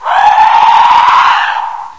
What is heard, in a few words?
alert close, horror; slender